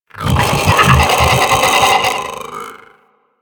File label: Sentient Death, Enemies; Warframe; Sentients; Ortholyst